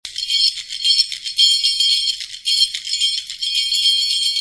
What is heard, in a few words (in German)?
Ringelgloeckchen